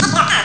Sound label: teckno pop; ST-XX Amiga Soundtracker Sample Packs